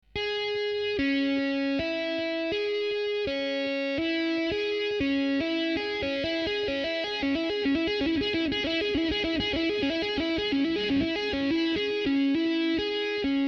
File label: hammer, guitare